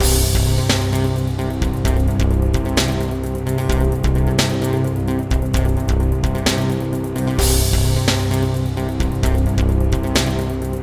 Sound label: Groove Bed